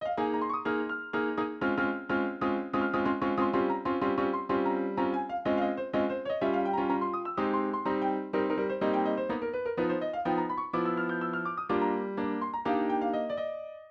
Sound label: jazz